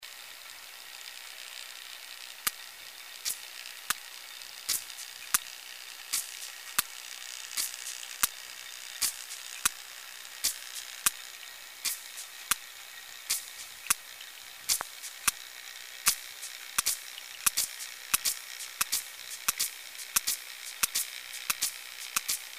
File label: P macrocephalus clicks echoes